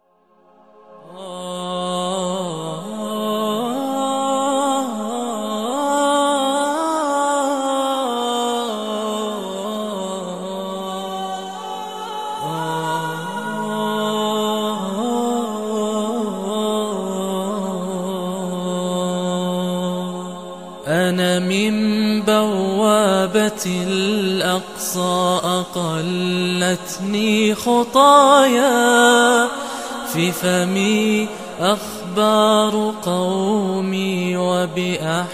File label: Vocals Only, nasheed